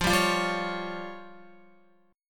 F Suspended 2nd Sharp 5th F down Guitar; Fsus2#5 strummed; JGuitar

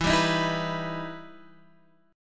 E Minor Major 7th E down Guitar Standard; EmM7 strummed

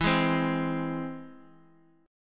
E Augmented

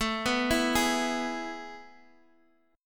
A Major 7th Suspended 2nd, Guitar, DADGAD; chord

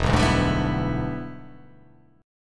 E 7th Sharp 9th, chord

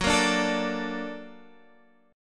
Bb Augmented Major 7th Gb

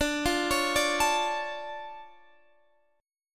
D Minor Major 7th D down Guitar Standard; DmM7; arpeggiated; capo=2

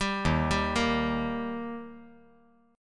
Eb Major Eb down 5G,4C,5E,5A; arpeggiated; JGuitar

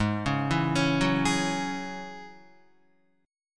G sharp 7th Suspended 4th Sharp 5th, Guitar; arpeggiated; chord; 3,4,4,6,6,4

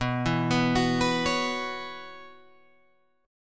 B Suspended 2nd Suspended 4th, Guitar, Open G

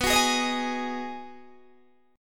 B 6th B down Guitar Open D

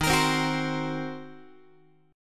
D sharp Minor 6th D sharp down Guitar; strummed; JGuitar

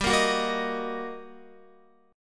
G Minor Major 7th Sharp 5th, down, Guitar; GmM7#5 strummed; JGuitar

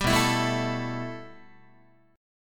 B 7th Suspended 4th Sharp 5th B down Guitar; B7sus4#5; strummed; JGuitar

